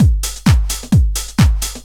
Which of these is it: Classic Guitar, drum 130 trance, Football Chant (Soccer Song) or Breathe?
drum 130 trance